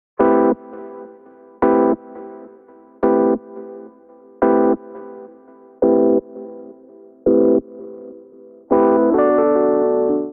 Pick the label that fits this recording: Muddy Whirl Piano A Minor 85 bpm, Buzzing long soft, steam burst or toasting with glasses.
Muddy Whirl Piano A Minor 85 bpm